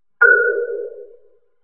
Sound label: Cepstrum Cross Synthesis